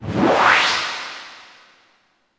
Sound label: Short Fills & Reverse; Vengeance Samples